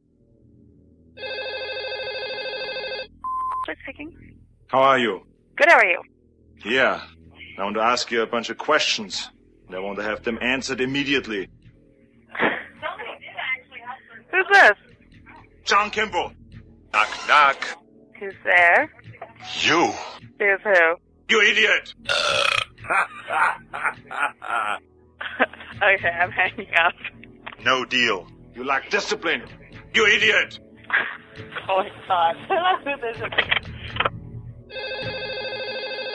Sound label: burpinator